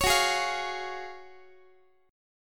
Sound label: Gb Major 7th Suspended 2nd, Guitar; GbM7sus2 strummed; x,x,16,16,12,16; 4A,4E,5A,5D,5G#,6C#